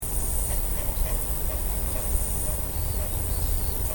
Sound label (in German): Silberreiher; see